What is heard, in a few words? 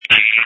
screams